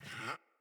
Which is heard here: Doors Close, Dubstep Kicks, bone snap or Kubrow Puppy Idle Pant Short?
Kubrow Puppy Idle Pant Short